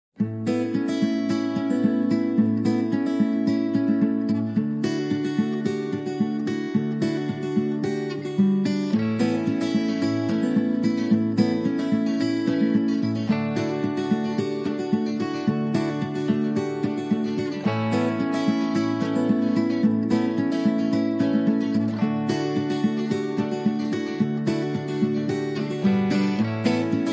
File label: Guitars Only